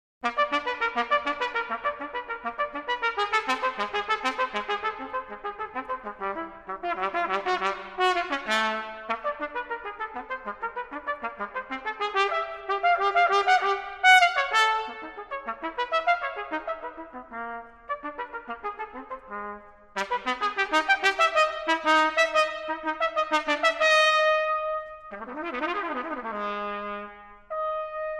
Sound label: Unaccompanied Trumpet; Cascades For Unaccompanied Trumpet